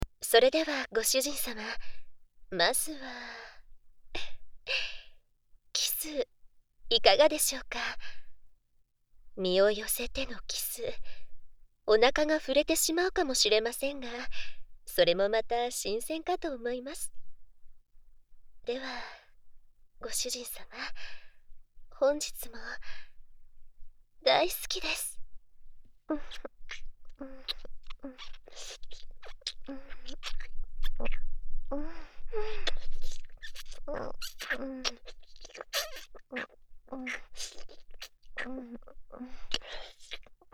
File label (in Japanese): あまあまキス、耳舐め, ASMR